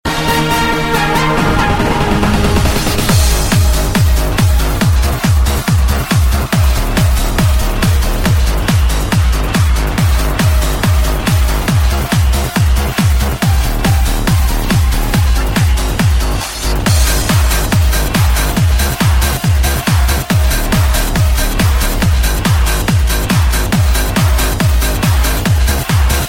Blackberry Bass

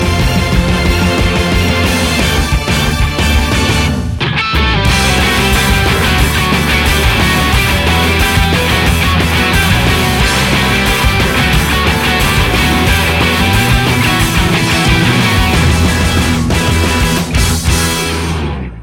Singing Inst; K-on

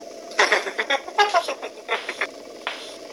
Granny laugh

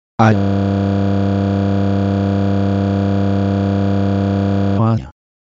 Ultra Sound

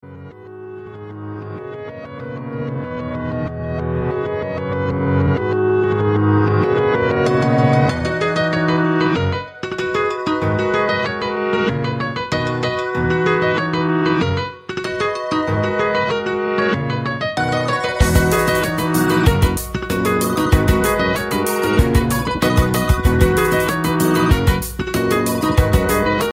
Pizzicato Notes

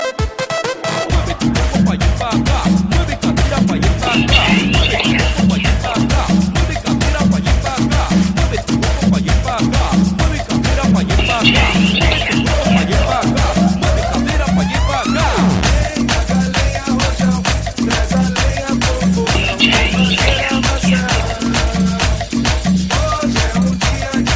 Tribal mixx

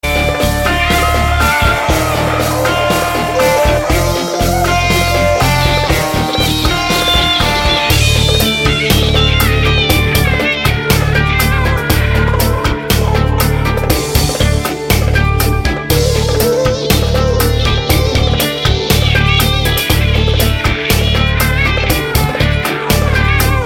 New Arabic

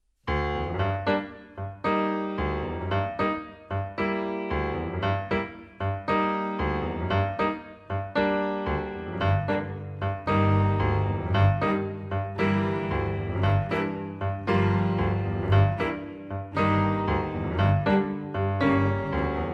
Tango Piano

